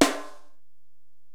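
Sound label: Acoustic Snare, Alesis DM-PRO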